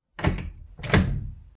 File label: Door Handle